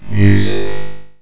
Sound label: Music